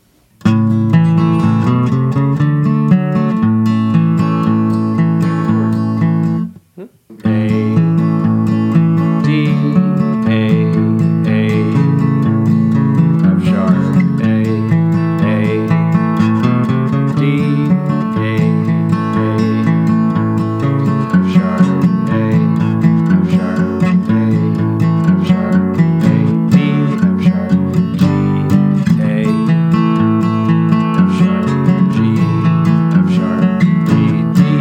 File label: GTR